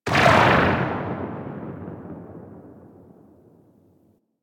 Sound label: Anti Aircraft Gun Tail B; Weapons; Warframe; Archwing